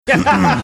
throat clearing

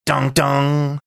doing law order clang sound

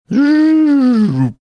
making sliding down sound

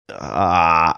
aaaaagh

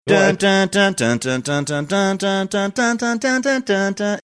singing part

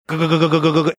making demolition hammer sounds

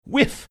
whiff

